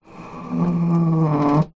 industrial door metal cupboard engine room open scrape